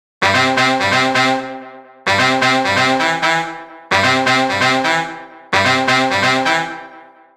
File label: Whoop, latin